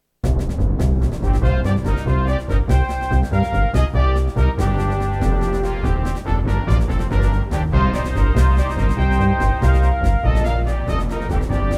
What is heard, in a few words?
Charge into Battle